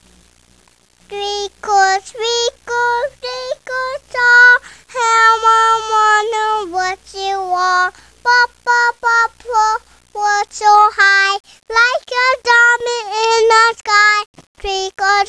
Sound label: twinkle